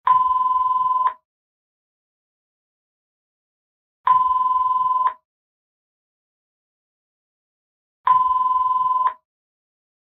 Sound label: ring; ipecs